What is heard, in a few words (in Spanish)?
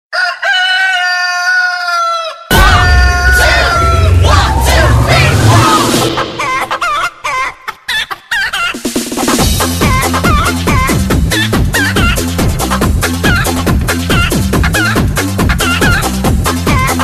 gallito remix